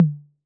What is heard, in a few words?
Tom Mid C 808